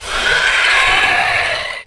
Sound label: Giant Wasp Death